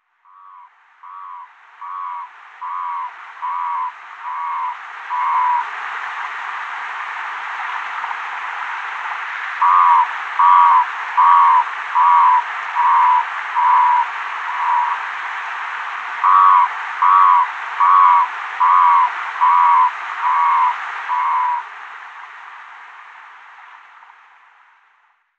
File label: Bufo guttatus